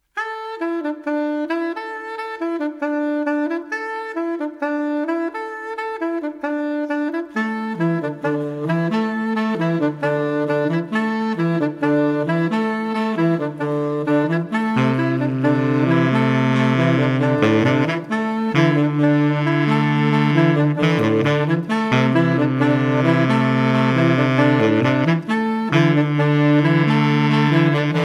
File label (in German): glocken, Saxophonquartett; Studio-Aufnahmen